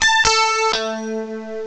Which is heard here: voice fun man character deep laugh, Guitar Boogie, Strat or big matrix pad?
Strat